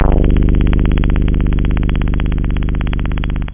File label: buzz; da capo vol1; synth